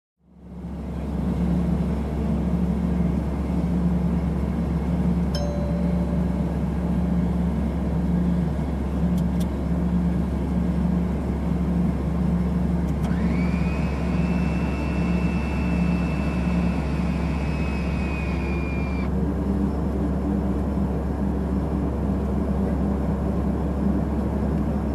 Cockpit Ambience with Ding and Clicks Sound

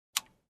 Overhead Bin lock click sound

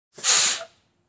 Trash Can Slid

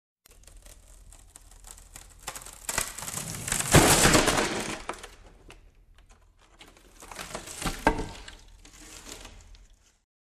Wood Falling and Crackling

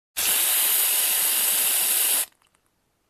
Hairspray sound effect

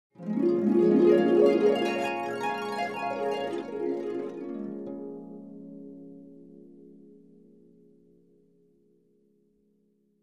Dream sound effect up and down glissando

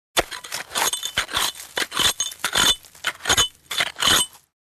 Scratching on dirt and stone with garden tool

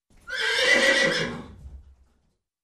Horse Bray

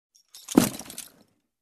Dropping object

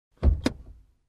Opening Car Door

